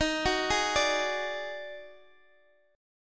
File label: Eb Minor Major 7th Double Flat 5th Eb down Guitar Open D